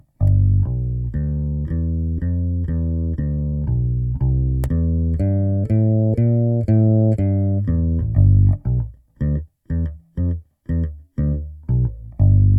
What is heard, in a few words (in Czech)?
Bezpražcová baskytara Cort B4FL - IR simulace (pro baskytaru); NUX NPB-5 Melvin Lee Davis Bass Preamp & D.I. Box